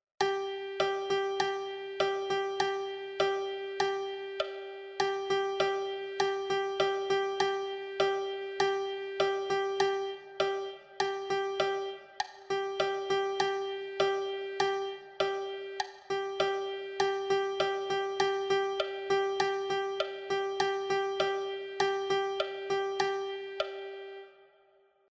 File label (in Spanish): percusion